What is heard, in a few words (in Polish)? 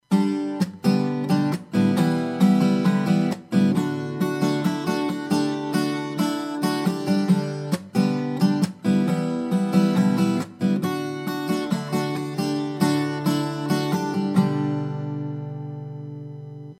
wstęp palce, gitara